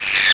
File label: monkey attack; Pirates Of The Caribbean; DUEL